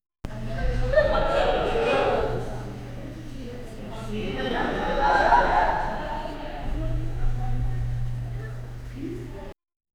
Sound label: quiet crowd whispering in, pool